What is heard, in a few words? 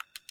Ui Camera-Sounds